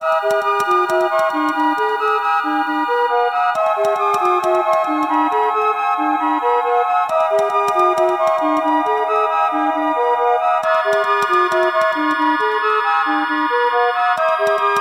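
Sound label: background music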